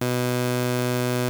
Prophet 5 B3, Synthesizer; Hollywood Edge - Giorgio Moroder Rare Synthesizer Collection

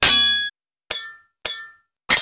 SWHIT, SWORDHIT; Total Control - Action Cutz

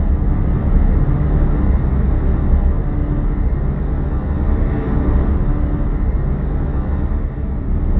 Drone Ambient; Drums & Drones

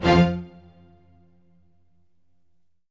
ORCH HIT F2; Q Up Arts; Denny Jaeger Private Collection

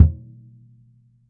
SURDO CL, BRAZIL

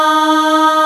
AAH D#3, POP CHO

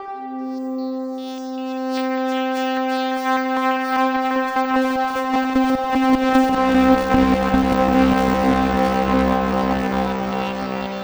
Ambient Melody; Melodics; Drums & Drones

